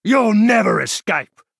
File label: attack; valenwood trapper